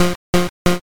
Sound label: picoeat